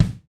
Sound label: kick, house